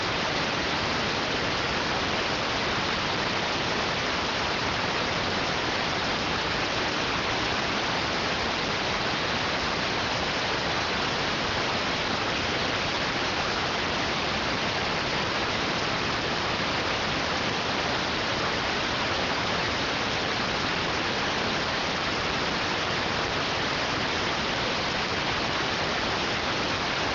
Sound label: rtty rx